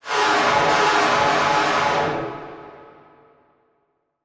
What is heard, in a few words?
Transition Whoosh Drone; Production Element; Title Transition; AdobeCC